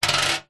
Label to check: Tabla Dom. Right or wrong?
Wrong